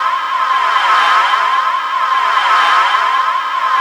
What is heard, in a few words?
WINDY CITY; Spectrasonic Distorted Reality 1